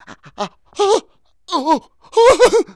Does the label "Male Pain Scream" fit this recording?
Yes